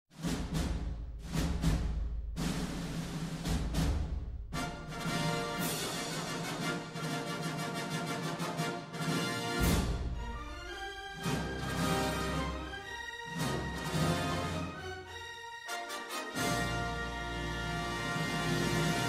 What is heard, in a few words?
fanfare intro; 20th century fox